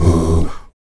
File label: VOX AHOO, Afro Chants, Stacc Ah Oos